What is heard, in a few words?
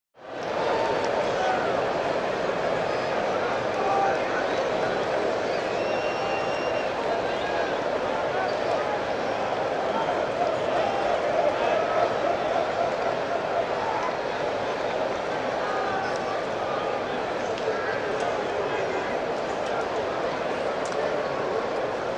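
stadium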